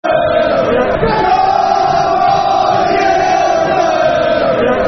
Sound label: Come On You Blues, Football Chant (Soccer Songs); Chesterfield Cfc